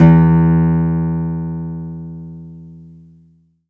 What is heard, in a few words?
Yamaha TG500 GT Nylon E2